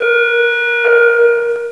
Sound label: alarm; XDM; ambience